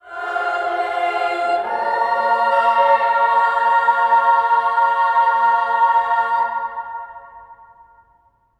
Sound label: ALLELUJ, ALLELUJAH, Symphony of Voices; Spectrasonics